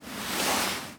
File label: foot slide; nmr zomb shared